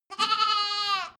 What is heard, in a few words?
goat lamb baa